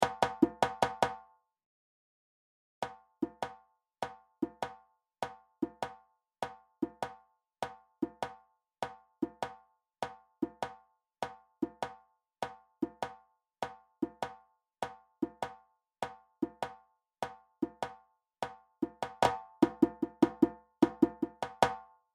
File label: Djembe